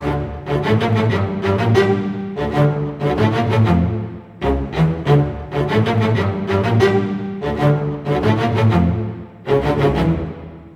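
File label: D minor, Low Strings, 90; PowerFX - Downtown Orchestra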